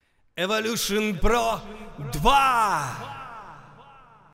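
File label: reverb delay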